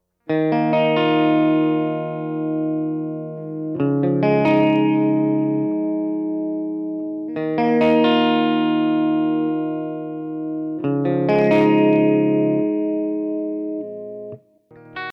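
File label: original electric guitar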